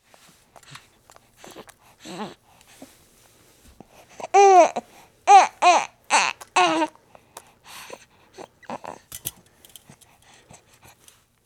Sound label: Baby cry